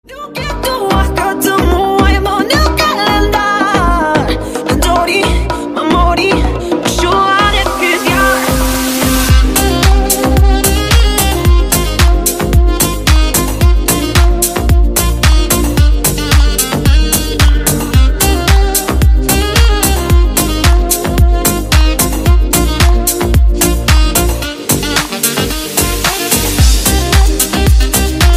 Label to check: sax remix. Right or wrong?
Right